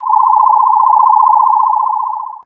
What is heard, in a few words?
Medic scanner, Retro Games Sound FX